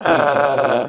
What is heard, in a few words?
growl, Dogz